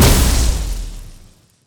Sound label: shoot; EMXArms; emx atlas; emx umx